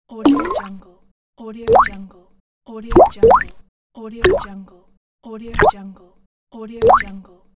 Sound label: water drops; pashastriker